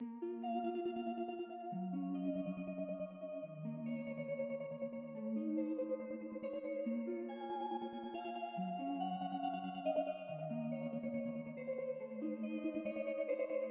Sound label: 140 bpm A m Synth Pad Melodic